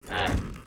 PEACE DAMAGE; KUR; Mob

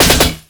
DBgun Metal, Damage

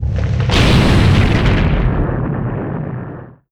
HOLY STONE KEEPER DESTROY

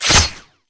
Sword cloth, Damage

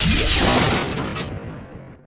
WAR DIE, Mob; HOBOTURNPIKE

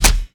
Mace Shell, Damage

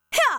Hunter attack

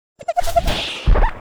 ATTACK; Chooty; Mob

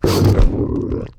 warbeast attack

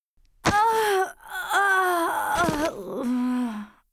Hunter die

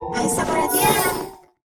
RECRUIT ATTACK; BARAS; Mob

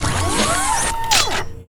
WAR IDLE; ARTHROP; Mob

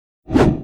mace, Weapon

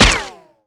Gun Scale, Damage

